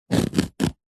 foley foam block dense slice score sharp knife tip